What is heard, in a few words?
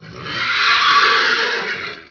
mutant